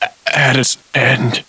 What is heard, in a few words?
die